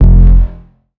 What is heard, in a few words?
Bass F, Bass Synth